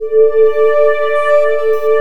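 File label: ambient pad, synthesizer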